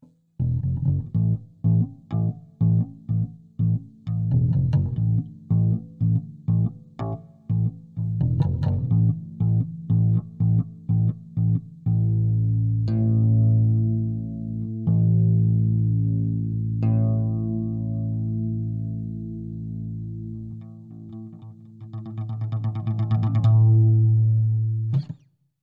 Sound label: bass riff